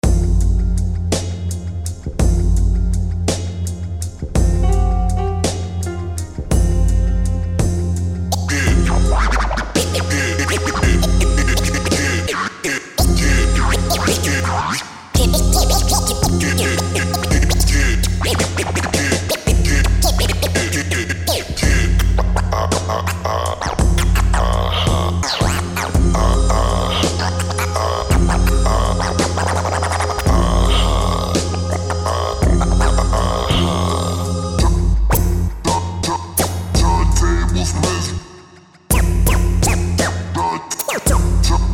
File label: Bonus Scratches; Burntablism Volume 2